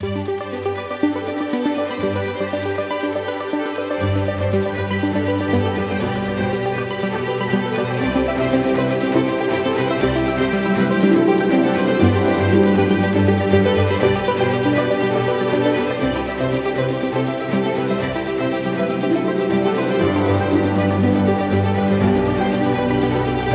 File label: Drive Ambient